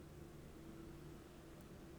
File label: pulses, BALAENOPTERA; MONACO 2022-07